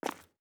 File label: Footstep Concrete Walking